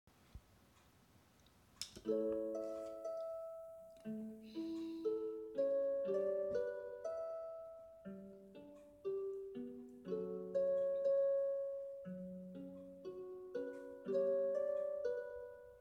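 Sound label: Karaoke, Singing, Female; VideoGame Cover; Undertale; Mettaton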